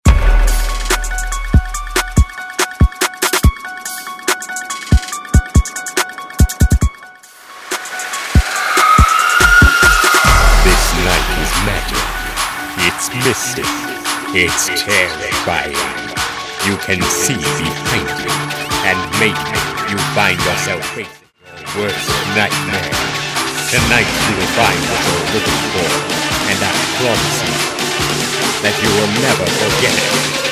Halloween Trap

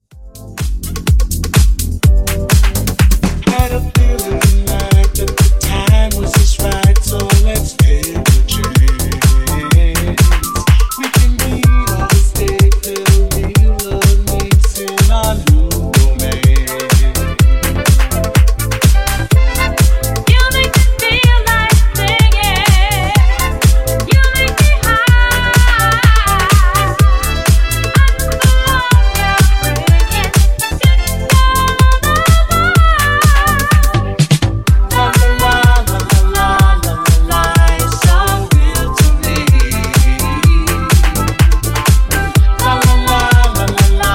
Funk Bootleg 125 Bpm

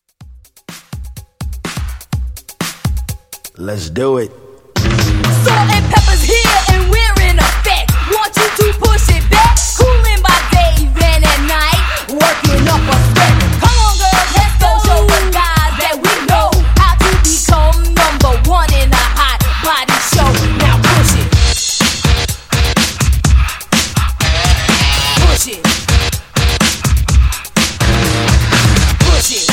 Old School Hip Hop Mashup; Remixfreakz

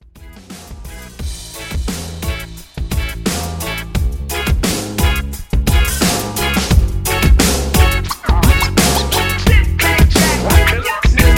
Mashup CLEAN

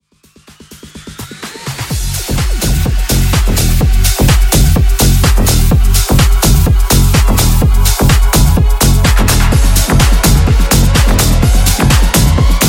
Mashup; Remixfreakz

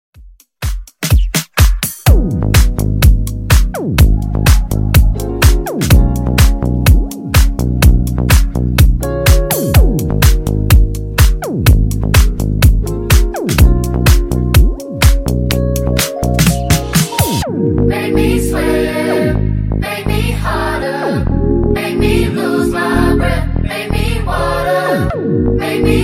Funk Nudisco 125Bpm; Edit v2

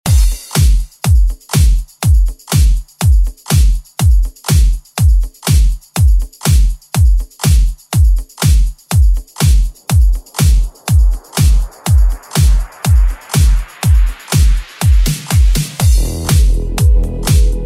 Dance Bootleg

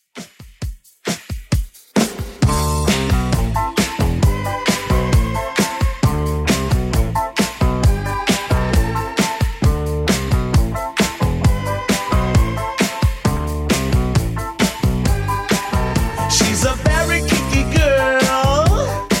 80s Redrum 133pm

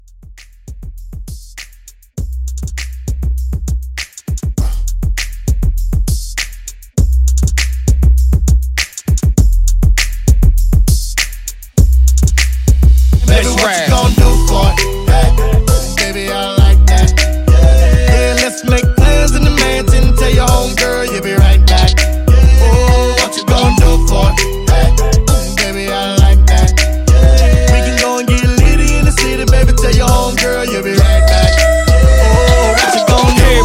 Mixshow Re Drum 100 CLEAN

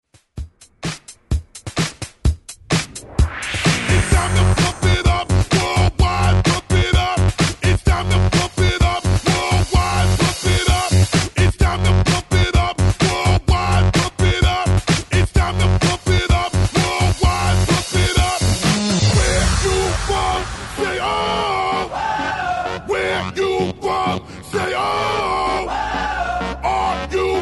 Party Hype Banger 128 Bpm

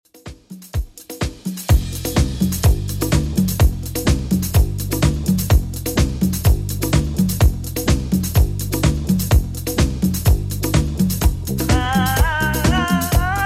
70s Redrum 126bpm

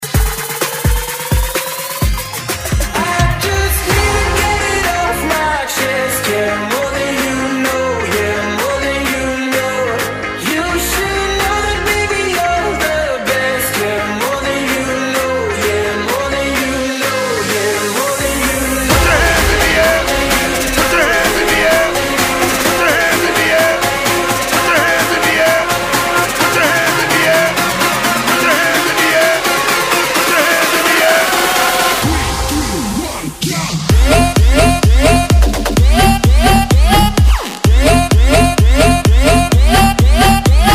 Crowd Energy Bounce Bootleg

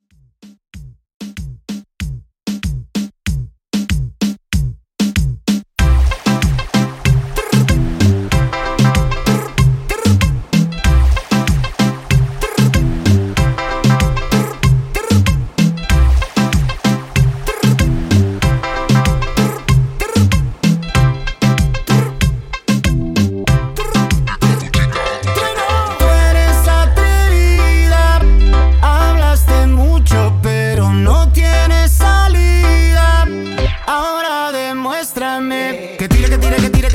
In Outro Reton 95Bpm

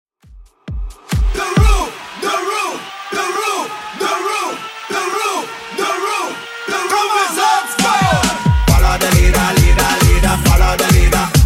Latin 135bpm; 2ks Latin Redrum

